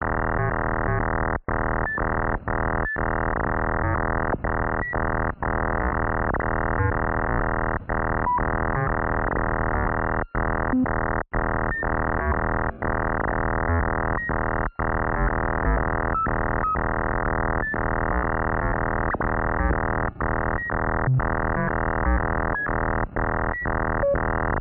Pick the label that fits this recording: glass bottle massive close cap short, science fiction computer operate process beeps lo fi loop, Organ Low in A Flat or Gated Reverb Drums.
science fiction computer operate process beeps lo fi loop